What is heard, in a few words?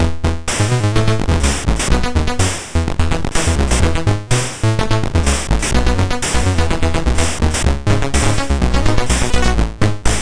Pick n Pile, MOS6581R3